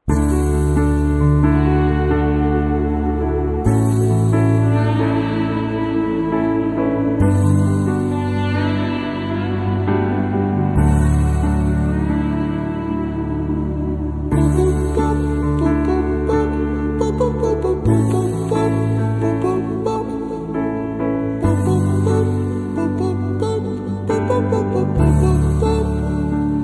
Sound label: bgm ringtones song ringtone; Adiyae movie